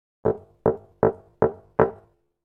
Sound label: Door knock sound effect